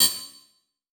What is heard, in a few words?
Production Element Title Transition Hit Impact Ping; Project Junker